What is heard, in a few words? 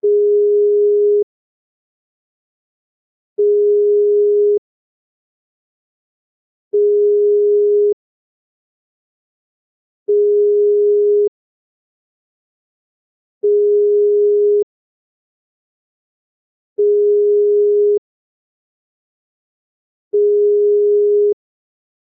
Tone